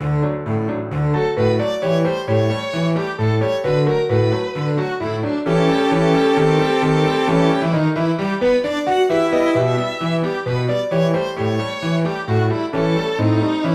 Quick Step; Violin, cello, piano; synth

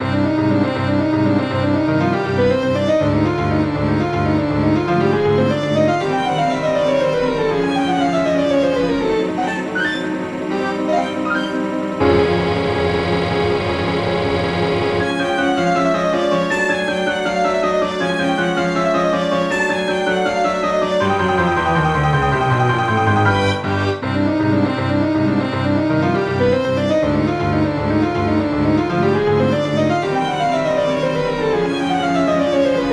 Hurry for storm, synth

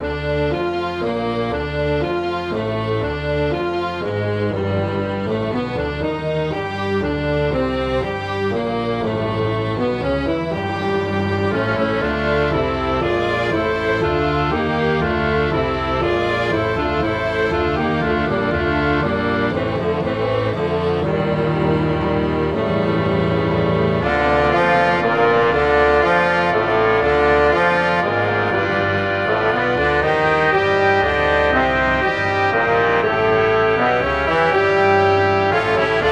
Fate for orchestra; synth

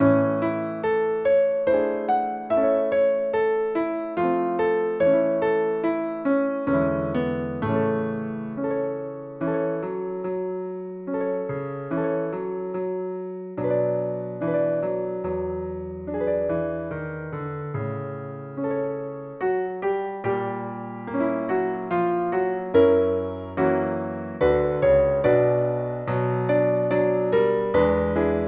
for piano, synth; My star of love

